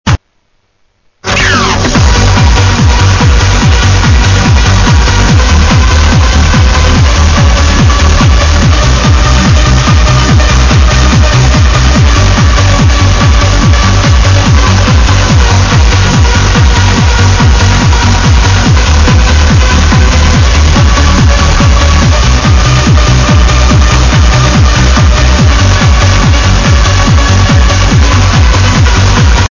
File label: trance